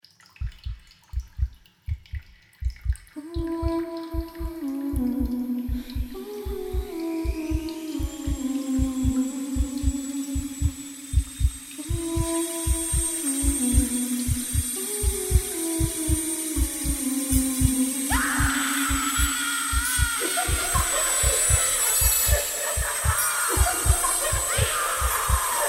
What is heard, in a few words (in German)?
Horror